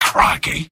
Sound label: sniper negative vocalization; tf; mvm